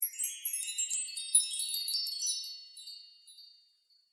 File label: u chimes